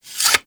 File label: Dagger Sheath; Warframe; Weapons; Dual Daggers